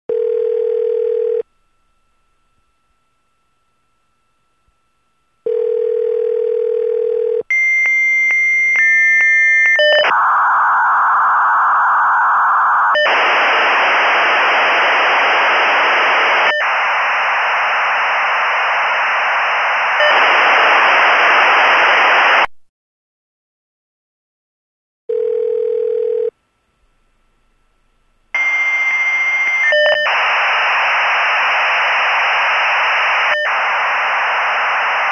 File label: Modem connection, signal, interference; computer